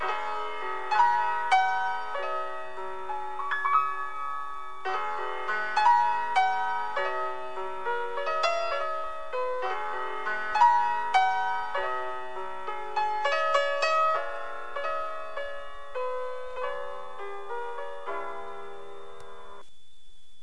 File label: PIANO